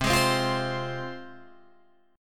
C Suspended 4th Sharp 5th, C, down, Guitar, Open G